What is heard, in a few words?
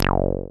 SYN 303, Roland TB-303